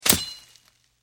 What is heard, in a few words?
bushes